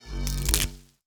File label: gather fruit expire